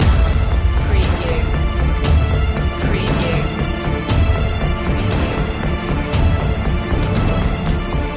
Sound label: the showdown trailer loop